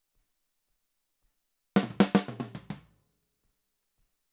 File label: Snare